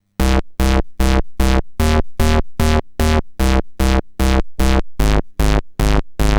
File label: C64 Donk Bass, Commodore 64, Chiptune